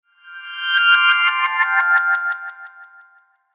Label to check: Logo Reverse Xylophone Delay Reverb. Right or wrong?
Right